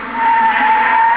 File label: car screech